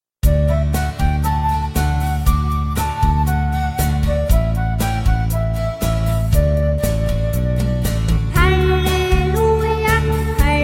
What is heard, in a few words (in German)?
Halleluja Ruf vor dem Evangelium, Kinderchor; apetloner kindermesse